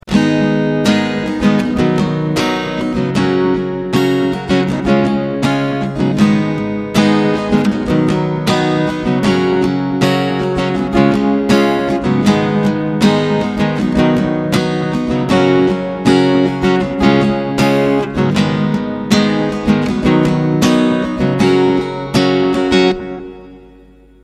GUITAR RINGTONE

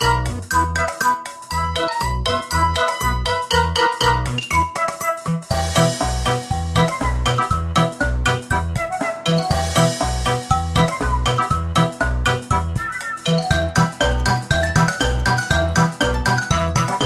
RINGTONE, INSTRUMENTAL; ANGRY BIRDS

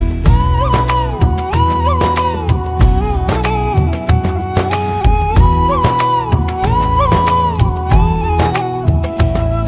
SHRIMAN NARAYAN HARI HARI FLUTE RINGTONE

